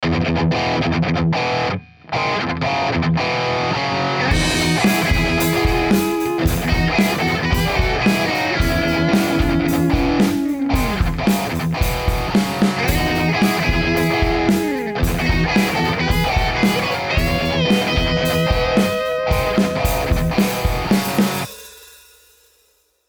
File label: Guitar